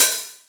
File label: Open Hat Analog Mono